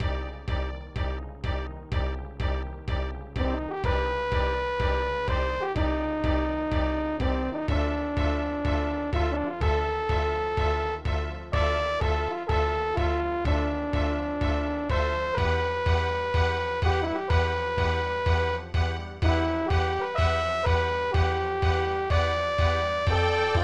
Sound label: Underwater Cavern